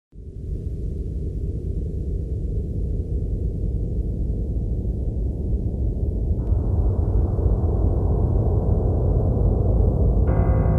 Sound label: cave